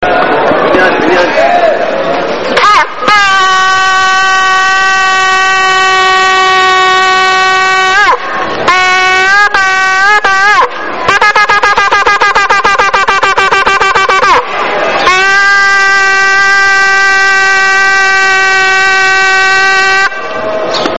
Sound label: shofar